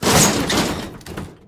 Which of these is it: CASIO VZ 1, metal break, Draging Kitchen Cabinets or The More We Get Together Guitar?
metal break